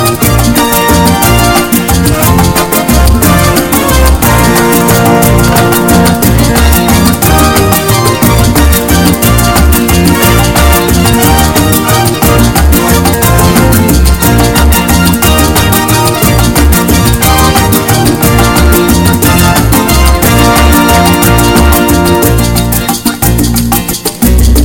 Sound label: Fast and Happy